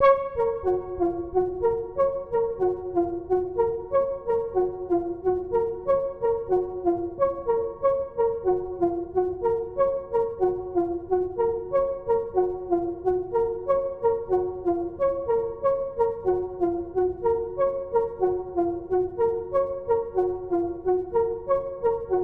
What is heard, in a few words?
synth